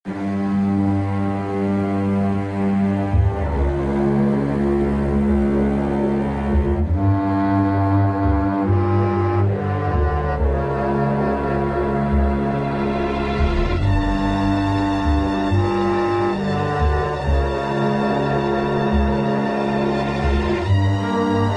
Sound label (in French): Ambiance l'ermitage